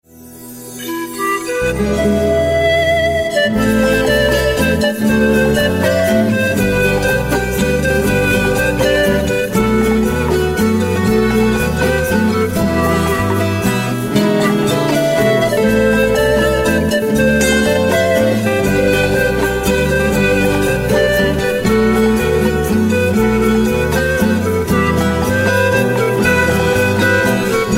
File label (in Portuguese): flauta, flauta andina